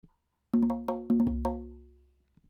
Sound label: accompaniment variation C